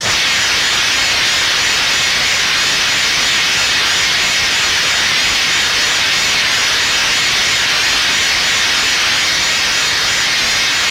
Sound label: Elect Arc Sustain, arcs & sparks